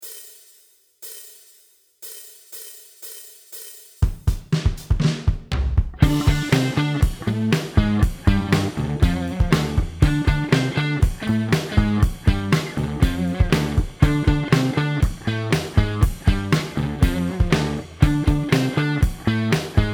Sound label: Backing Track With Lead